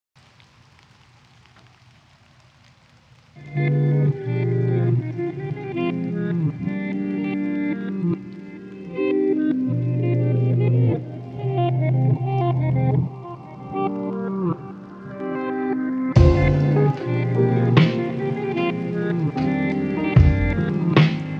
Lofi

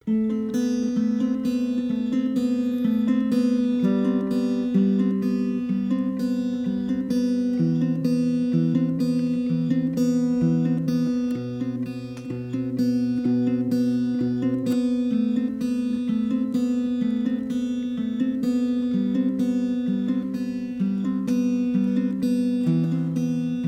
Instrumental

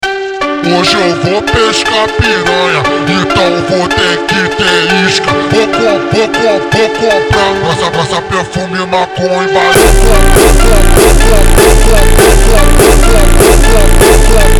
hardstyle slowed

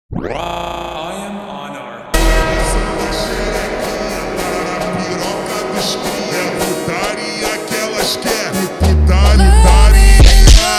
montagem slowed

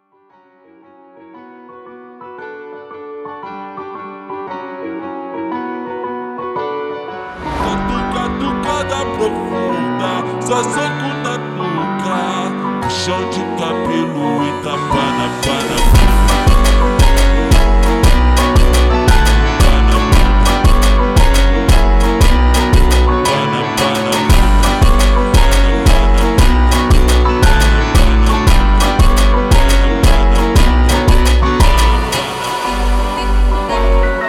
Super Slowed

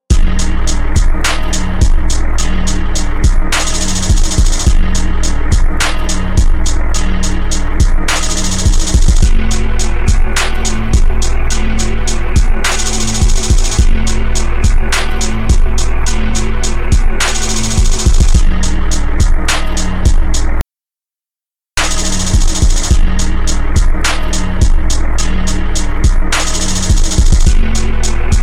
Instrumental

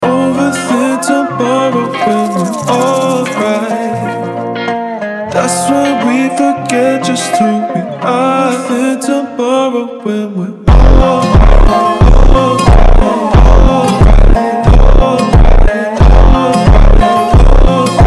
WESTERN FUNK Slowed

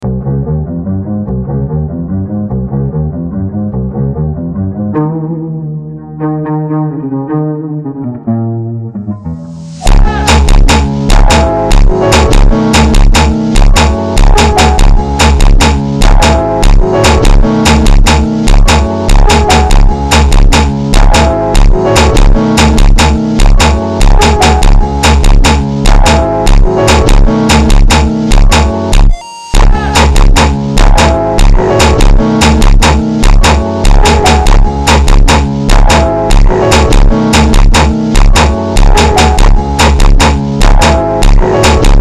FUNK Ultra Slowed

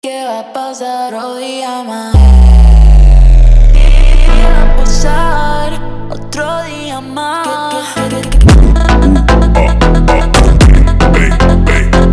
Slow

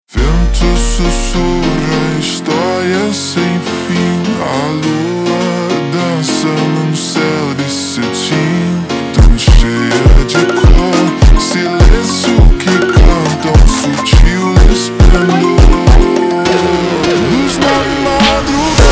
Super Slowed Hardstyle

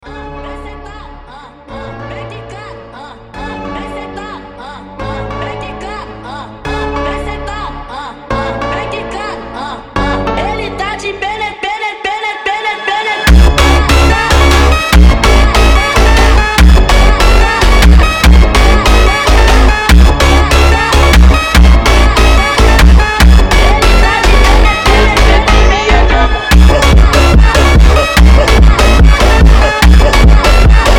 FUNK Sped Up

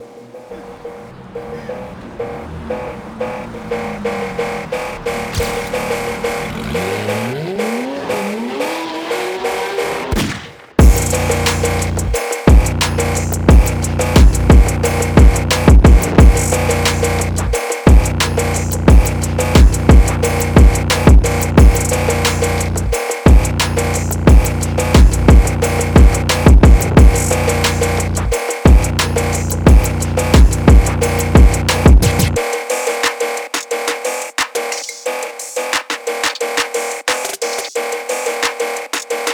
phonk

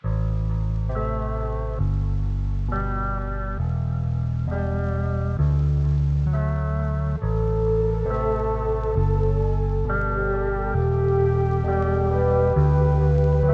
(lofi version)

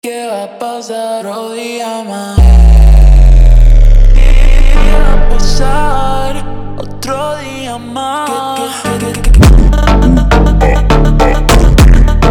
Super Slow

